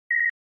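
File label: Flash Beep Electronic Double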